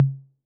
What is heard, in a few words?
Tom Mid A 808, Clean Digital